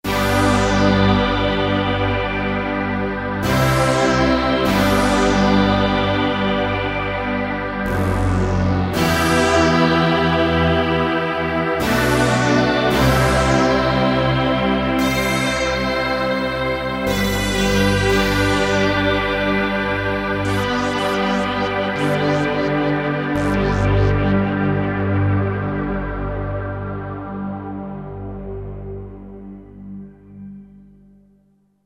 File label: Synth Orchestra; Kurzweil K2000 Orchestral ROM